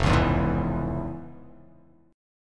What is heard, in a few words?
F Minor Major 7th Sharp 5th